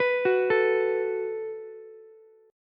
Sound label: Gb Minor Double Flat 5th, Ukulele; arpeggiated; 16,18,17,x